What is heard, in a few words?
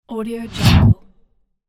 transition movement